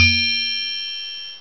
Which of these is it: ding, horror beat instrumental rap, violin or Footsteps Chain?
ding